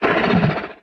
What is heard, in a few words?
stomper idle big, enemies; Factorio